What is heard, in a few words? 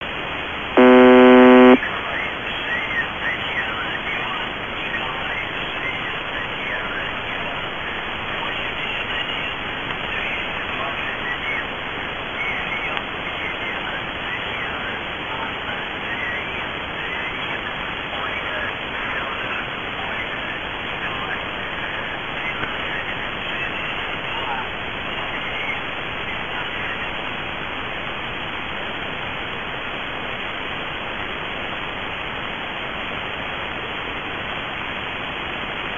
Buzzer